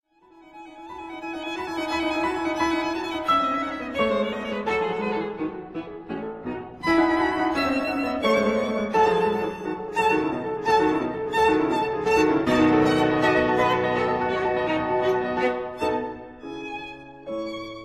Sonata for violin & piano in A major, Presto; No. 9; Kreutzer; Op. 47

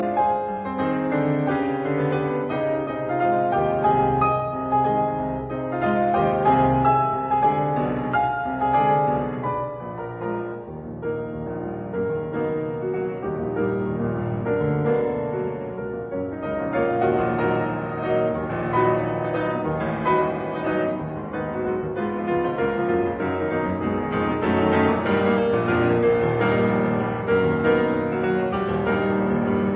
Piano Sonata in F-sharp minor, Allegretto; Op 23

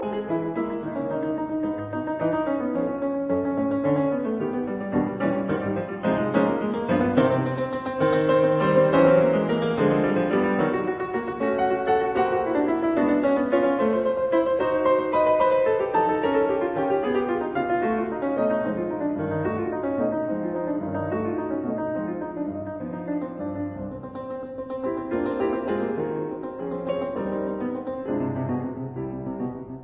Preludes in C minor Allegro; Op 103

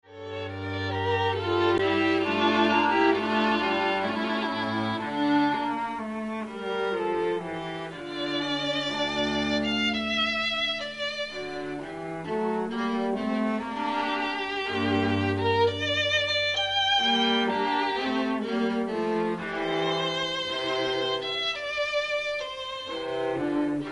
Prelude in G minor

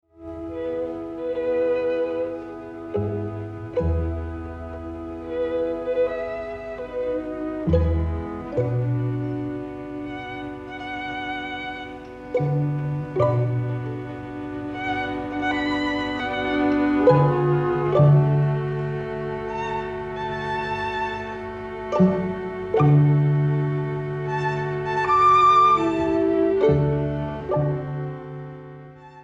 Quintet in C Major Op. 163 II. Adagio; Schubert

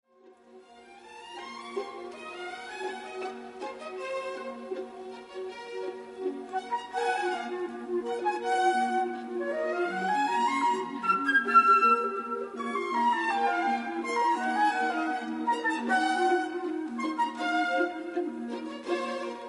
Symphony in F sharp minor; Symphony No. 10; incomplete; Purgatorio

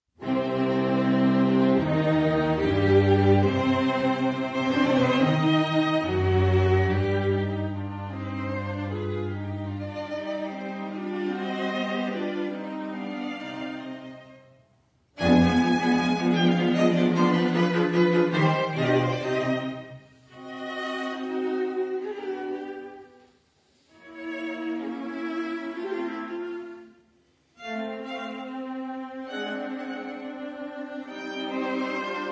Viola Quintet in C Allegro

